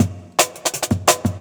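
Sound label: Break Full Drums Martial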